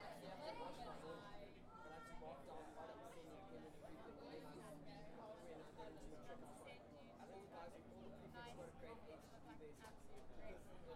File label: Ambience